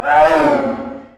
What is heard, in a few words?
worm basic attack; LoL; monsters